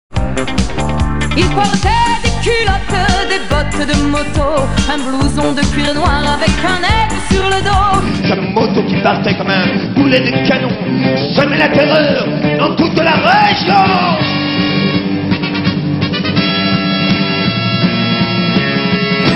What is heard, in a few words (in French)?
Chorale